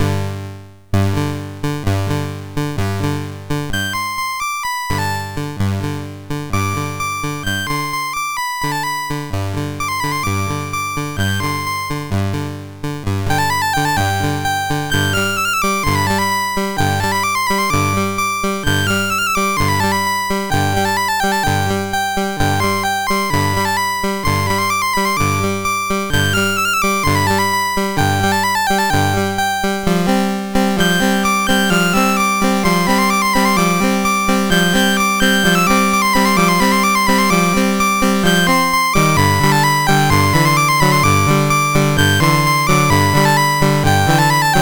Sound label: MOS6581; Swineherds Dance; Syl